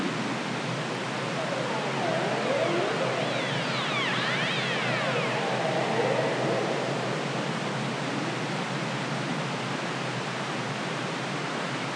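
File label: Ross seal underwater siren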